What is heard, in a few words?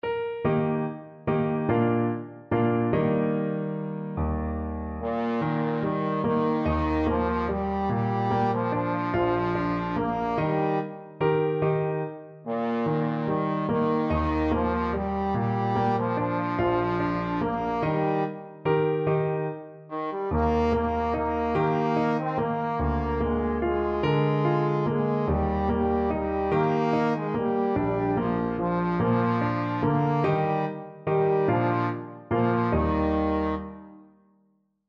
trombone